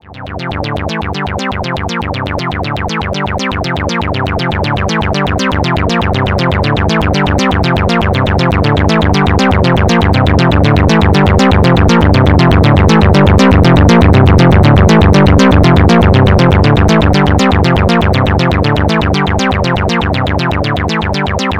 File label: Filter Saturation